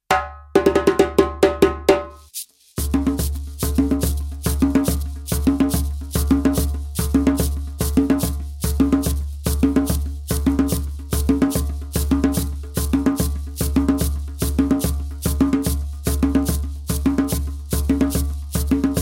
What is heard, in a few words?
djembe